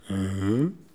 hum réflexion

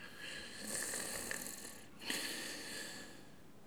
ronflement

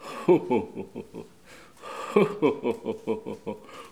oh oh machiavélique

